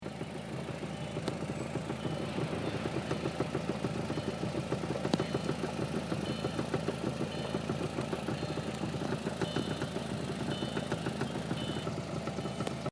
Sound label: sound, 2001 audi a4 1.8 wagon car; help what causing sound